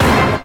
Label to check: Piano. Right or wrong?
Wrong